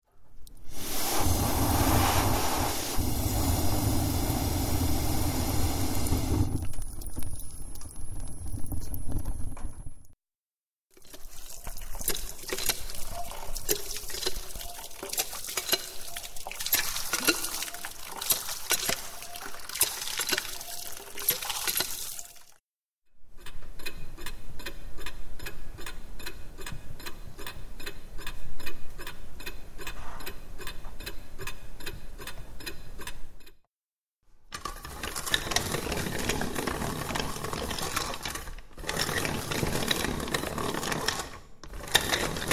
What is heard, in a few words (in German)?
Geräuschcollage